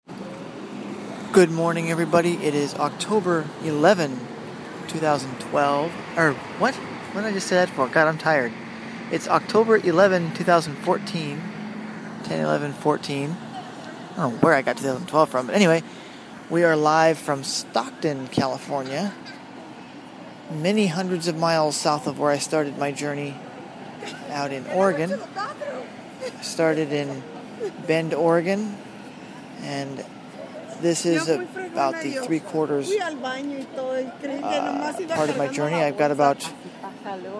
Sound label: stockton train station announcements